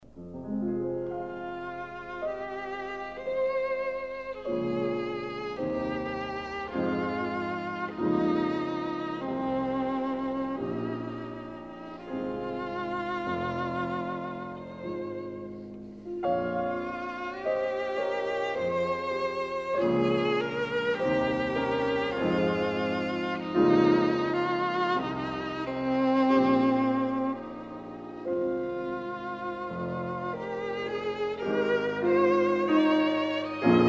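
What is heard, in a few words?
violin sonata; medtner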